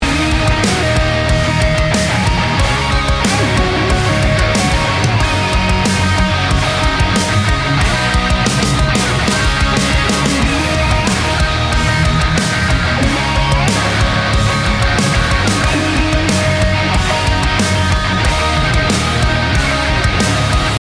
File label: Instrumental